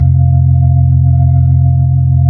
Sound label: B-3 Chorus; Roland LCDP10 Keys of the 60s and 70s 2; Br. Ch. Xfade; Br. Chorus Wh